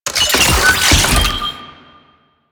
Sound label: Scan Pillar Plant Open